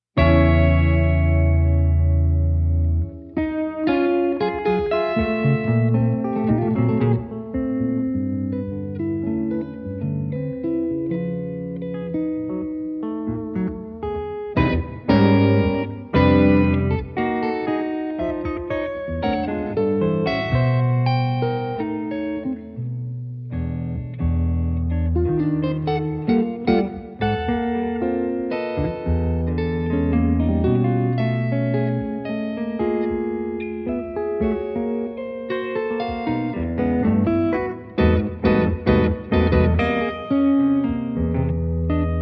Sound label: electric quartet